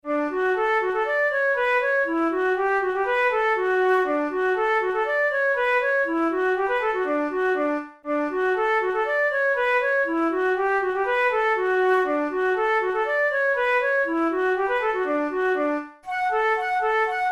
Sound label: polka, flute tunes